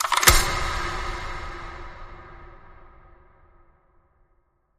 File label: Combat Unit Weapon Reload